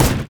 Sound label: golem basic attack, monsters; LoL